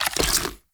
Bite Small Impact Flesh; Weapon Impacts; Enemy; Unarmed

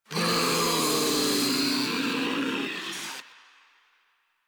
Infested Ancient Death; Warframe; Proto Infested

